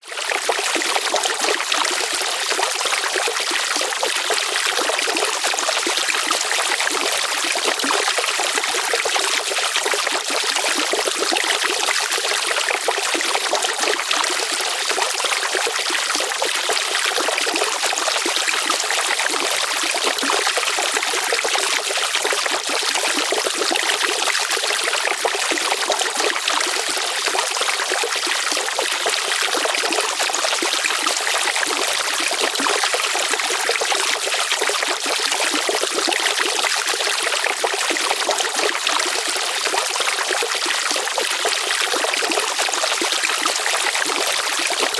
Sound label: water pour flow medium light; nature